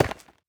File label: Ancient Debris dig; minecraft